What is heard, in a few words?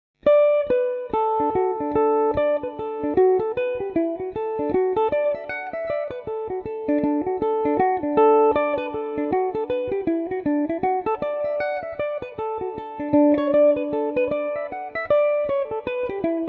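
D major, Irish mandolin; lucy campbell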